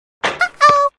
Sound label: miss